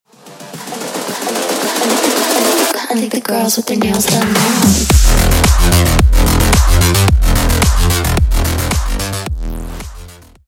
cyberpunk drop

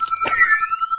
thermal detonator beep; lego star wars

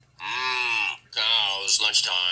moo cows lunchtime

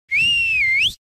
whistle bloww

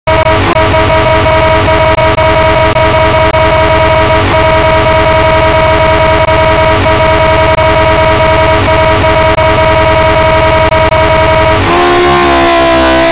a really strong glitch

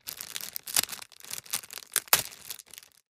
opening food

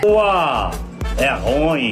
ouaaaehhrruimm

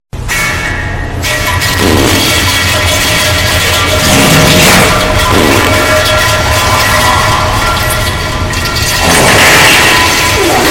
fart chaos with reverb

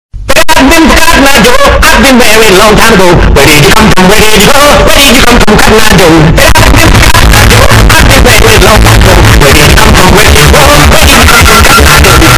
loud

